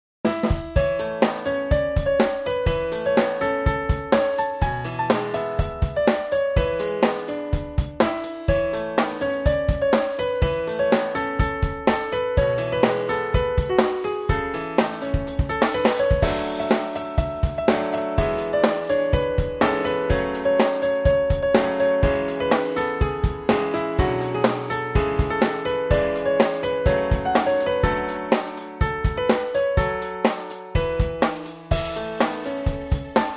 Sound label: Piano